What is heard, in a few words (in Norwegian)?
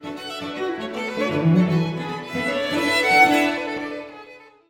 Udelt takt